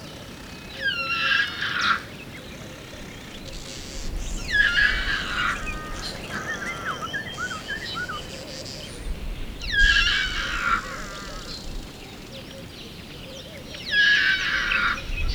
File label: Procavia capensis call-over